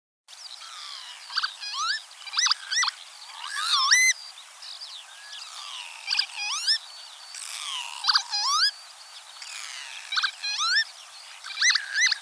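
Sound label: chibis golos, lapwing voice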